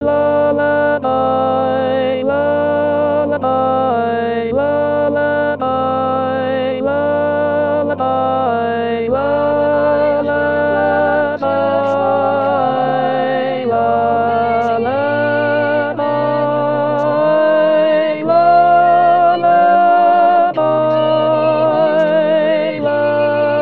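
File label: Alto 2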